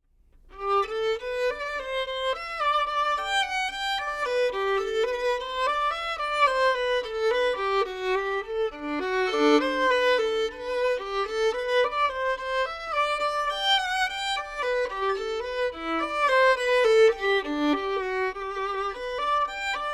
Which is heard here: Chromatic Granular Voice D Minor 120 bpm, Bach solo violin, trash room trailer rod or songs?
Bach solo violin